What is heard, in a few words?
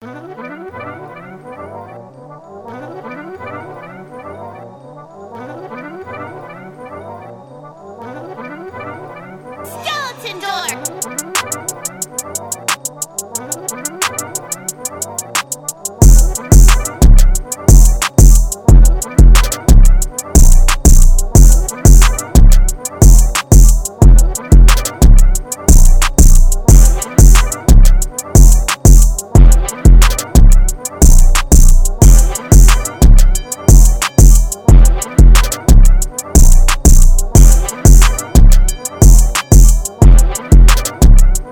180 bpm